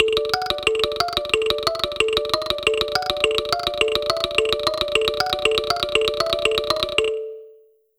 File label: Time Attack end; Slinki